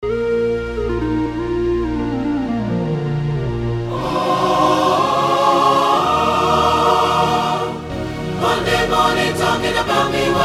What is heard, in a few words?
Loud Urban Choir, Cover